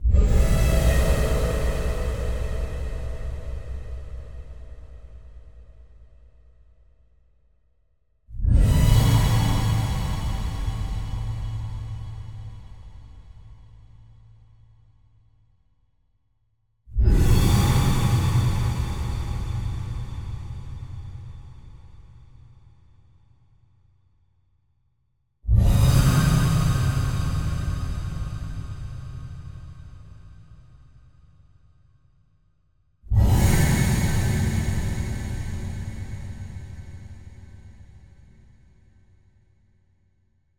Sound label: Impact element Tension; MatiasMacSD; Affix